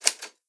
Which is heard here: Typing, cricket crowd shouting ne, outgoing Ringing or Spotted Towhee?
Typing